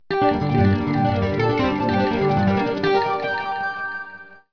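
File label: flanger, audio effects; flanging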